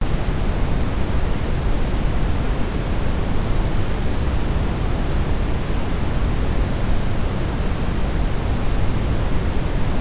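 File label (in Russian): коричневый шум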